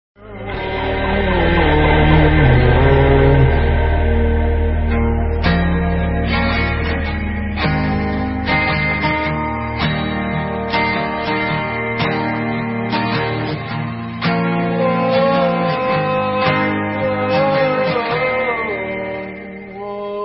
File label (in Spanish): musica triste sad days u oooooooo oo o; depressao